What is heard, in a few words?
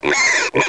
pig; SoftKey